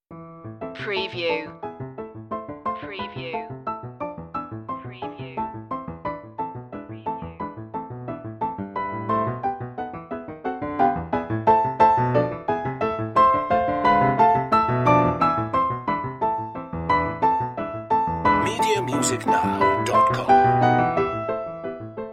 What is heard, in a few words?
chopin etude in a minor; op25; etude no 4